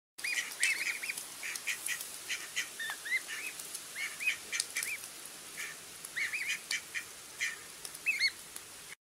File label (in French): L oison criaille